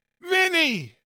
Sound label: coin mini; coinrain